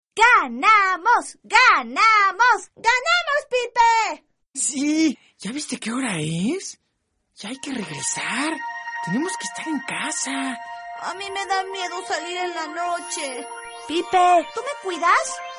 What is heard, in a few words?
pipe